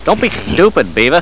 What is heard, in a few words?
pain; butthead